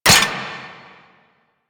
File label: RPG Mech; Hellion Elite